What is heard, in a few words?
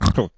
pig singing